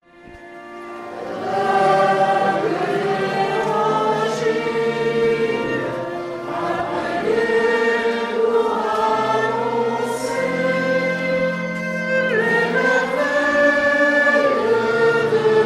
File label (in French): chant entree